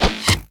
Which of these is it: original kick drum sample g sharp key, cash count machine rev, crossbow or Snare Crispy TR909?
crossbow